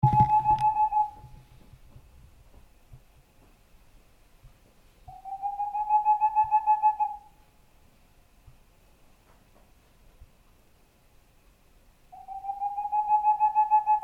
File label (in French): tengmalm, forez nord